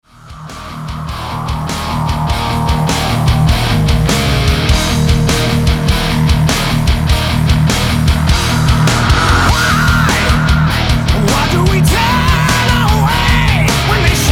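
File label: Palm mute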